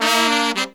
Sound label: 110, Pop & Funk Brass; Best Service ProSamples vol.25; AKAI